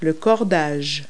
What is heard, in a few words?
string; Le Cordage